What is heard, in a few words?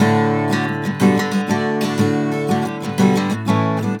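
guitar, 120, Celtic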